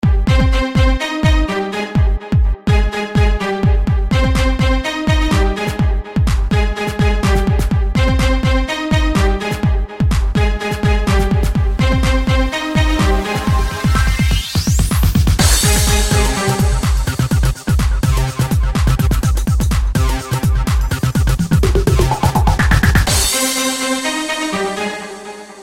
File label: Stach-hard Beat